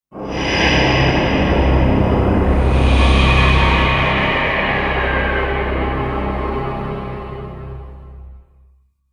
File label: Game Over; game soundtrack